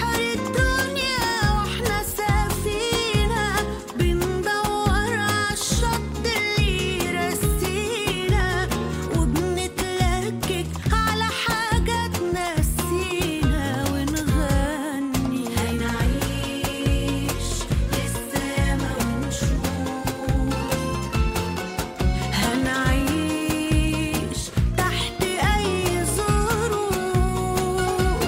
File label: new arabic ringtone